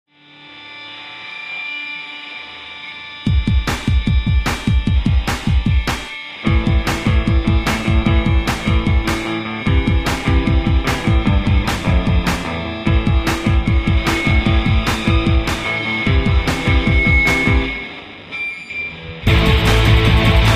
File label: Underwater